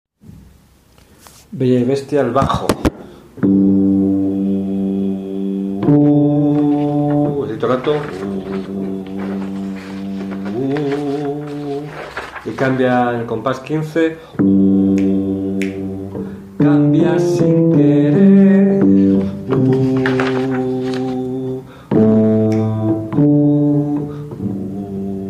bajo

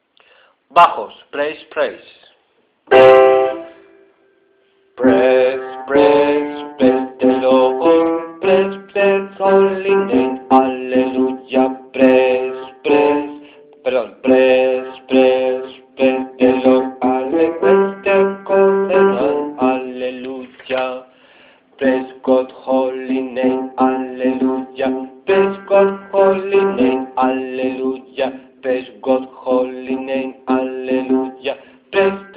bajo

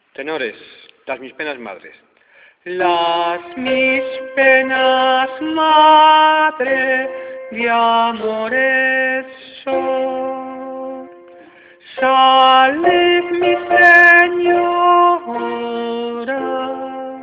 tenores

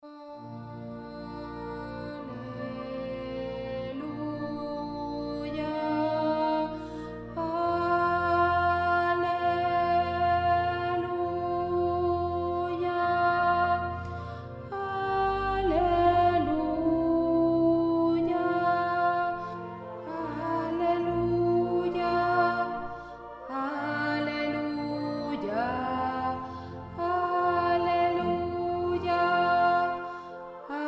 Soprano, aleluya

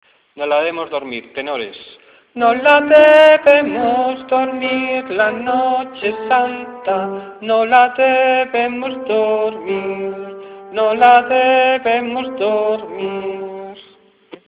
tenores

